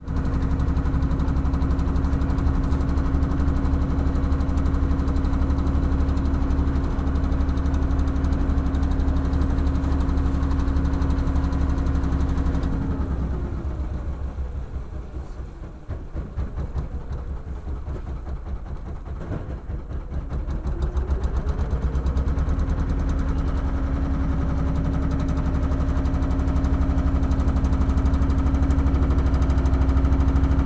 transport motorboat on mekong river engine run slow almost conk ou; ben tre; martinimeniscus